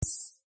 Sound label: mech nav